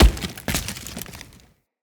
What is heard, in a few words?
Tree Dead Large Destroy Chunk